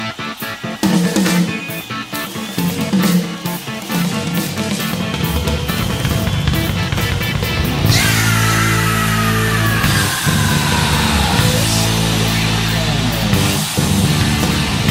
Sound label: Drum Solo; Covers